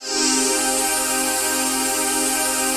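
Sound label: chord pad, Pads; Best Service; XX Large - Pads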